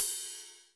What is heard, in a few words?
HR16 RIDE; Crypton - DrumBase5000